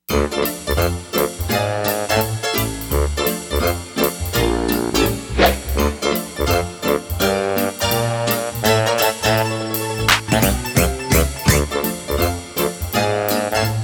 Comedy Style; Sound Ideas - Comedy Styles

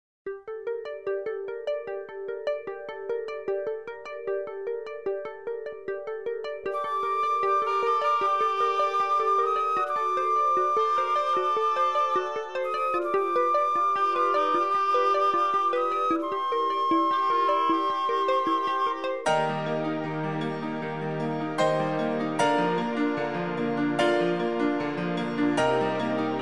Easy Listening & Classical; Sound Ideas

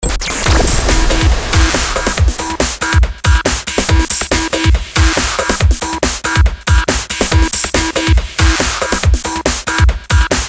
Noise Beds

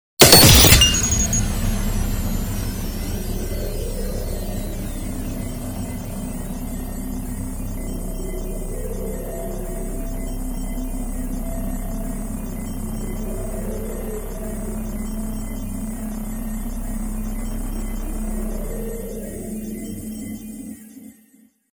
Galery Noise; Sound Ideas - Galery Noise 001

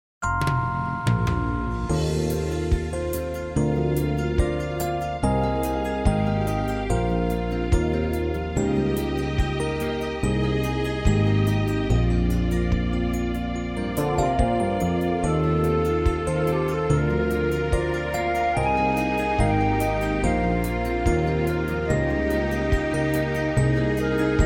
Easy Listening & Classical; Sound Ideas

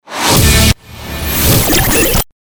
Galery Noise; Sound Ideas - Galery Noise 001

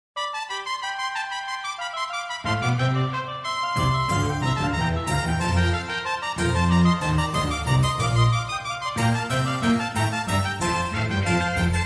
Easy Listening & Classical; Sound Ideas

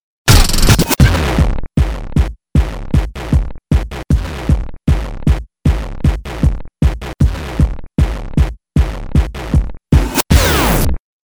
thump down

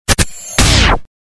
Galery Noise; Sound Ideas - Galery Noise 002

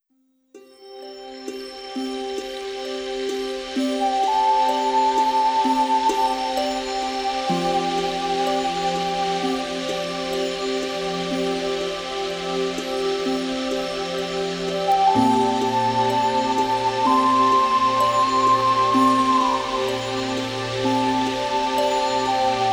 Indian Lullaby; Sound Ideas - Dramatic Soundscapes

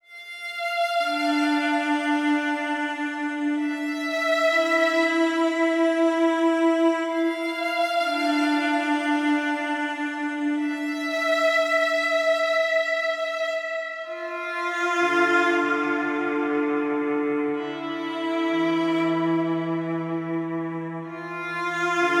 Deserted, violin medly

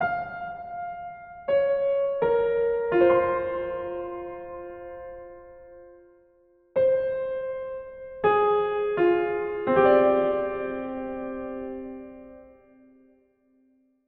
Plaintive, piano medly

